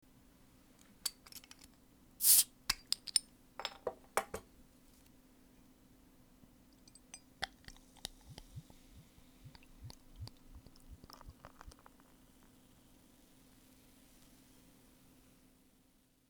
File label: bottle of beer